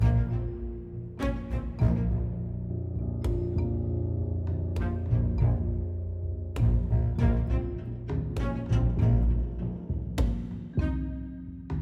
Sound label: Explore 100bpm; Biome 4 Explore 02